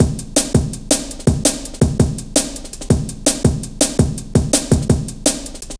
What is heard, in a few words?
Ruffneck, Drum Loops 165.5; Zero-G Jungle Warfare 1, 2 & 3